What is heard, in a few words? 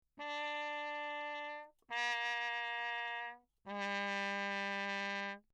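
trumpet mute Bach low; Perinétventiltrompete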